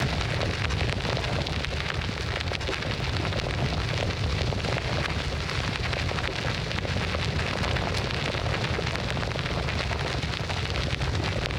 RODADURA EARTH YBR, YBR125

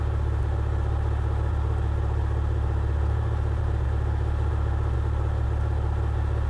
CAR REPOSO; SIMUZENSOUND

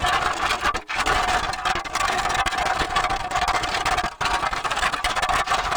ROCE CARROCERIA TURISMO; SIMUZENSOUND

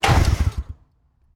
CALADO YBR, YBR125

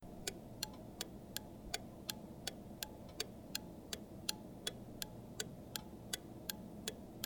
INDICADORES, YBR125

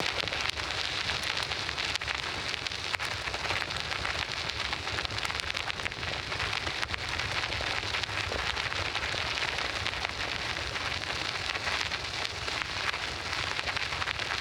RODADURA EARTH YBR, YBR125

